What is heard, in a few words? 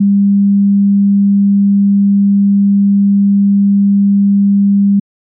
stereo phase test; speaker test